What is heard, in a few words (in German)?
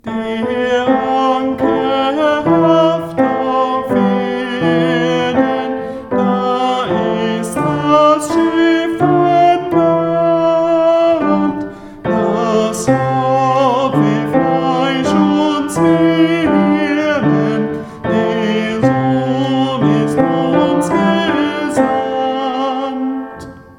T, adventsmusik tenor; Es kommt ein Schiff